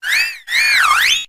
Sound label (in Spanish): Grito de Gossifleur